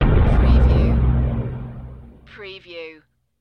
Force Field Impact

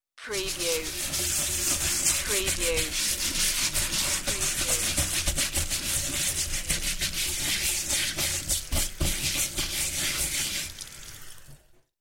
Scrubbing Pan

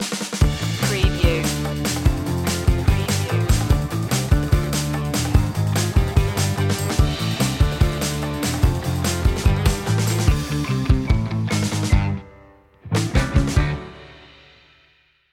driving fast groove; 15s

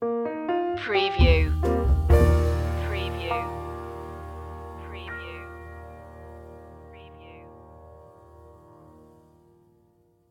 a passing glance stinger

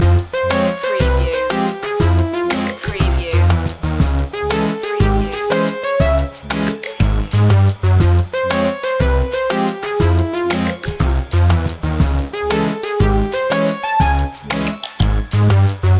retro video game ferris wheel loop

